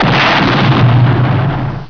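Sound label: explosion huge; weapons; Hexen2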